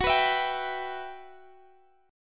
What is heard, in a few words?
E Minor F sharp, chord; Em/F#; strummed